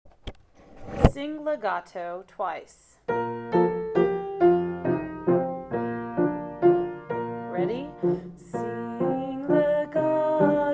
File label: sing legato